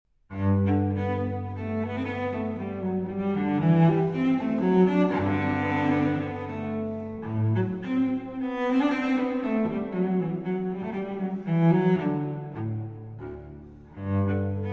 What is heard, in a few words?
Cello Suite No. 1 in G Major, BWV 1007; J.S. Bach; V. Menue